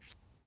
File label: raging bull remove